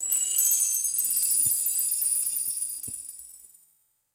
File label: bright magical twinkling bell burst